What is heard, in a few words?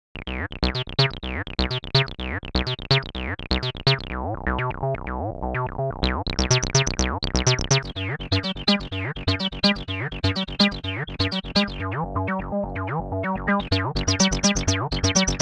Acid Bassline; Vague